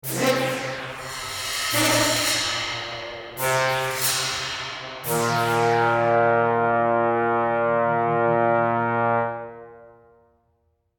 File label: EWQLSO Sequence